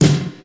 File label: eighties snare D key; snare drum samples